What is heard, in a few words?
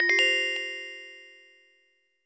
Open box